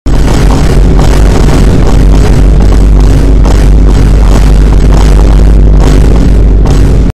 consistent vine boom

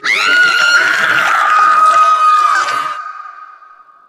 scream; smg4; meggy

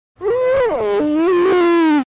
ohhhhh; mic

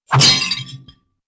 swish into wind chimes

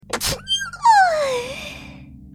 sneeze

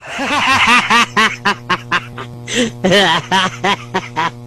hahahahahahahahaaaaa

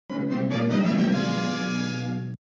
close up sound

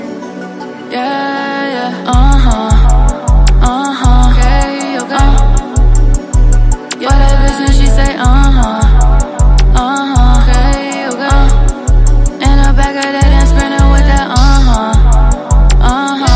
uhhh huuuuh

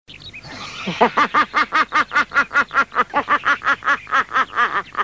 laugh

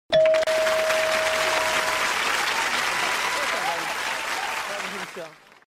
correct

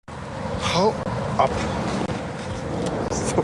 hauuuuuu

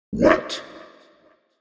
wet

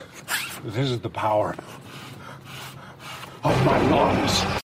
power of lungs

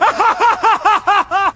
laugh

